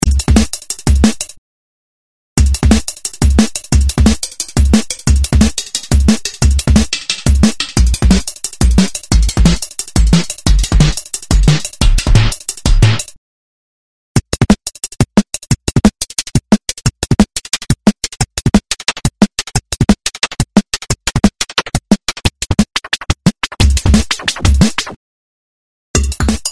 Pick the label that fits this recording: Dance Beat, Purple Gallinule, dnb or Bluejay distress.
dnb